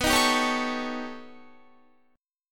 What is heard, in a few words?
B Augmented 9th B Guitar, chord